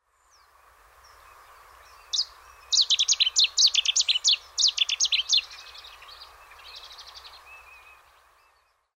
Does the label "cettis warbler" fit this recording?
Yes